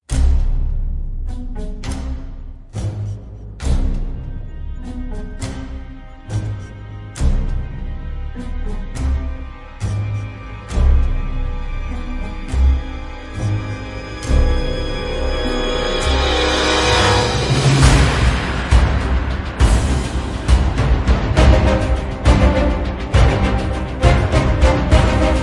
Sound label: Without Choir